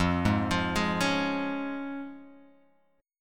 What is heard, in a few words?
F Minor Sharp 5th, Guitar Open G, chord